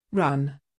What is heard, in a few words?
run